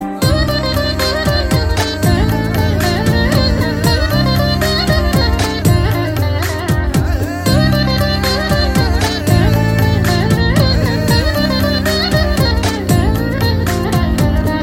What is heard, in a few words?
Instrumental Ringtone